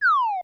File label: Falling Ball, Yamaha DD-35